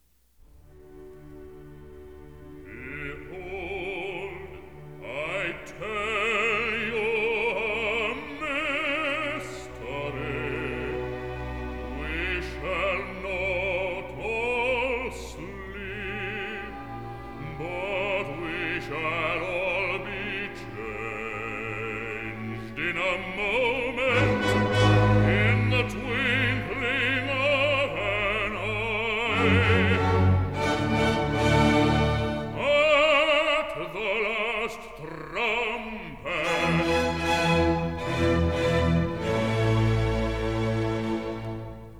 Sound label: Recit (Bass); Behold, I tell you a mystery; Messiah